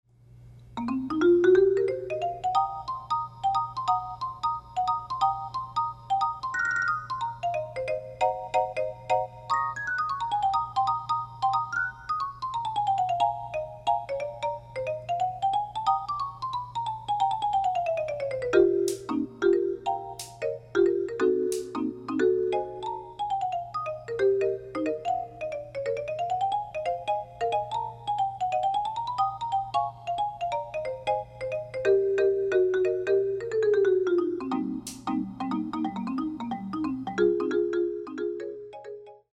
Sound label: rhodes marimba rag